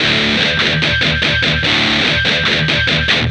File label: guitar; LiLA'c Records PsyTrance Sounds 01